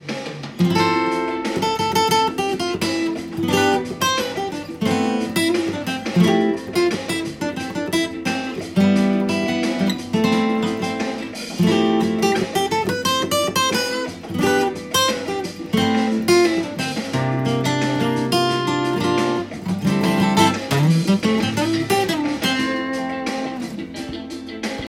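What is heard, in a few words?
acoustic guitar chord solo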